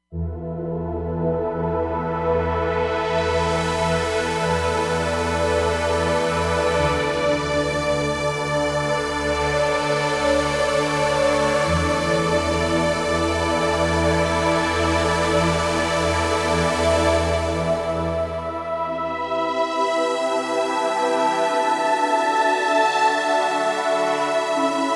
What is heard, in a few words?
Trns Sweep Pad, Roland Fantom XR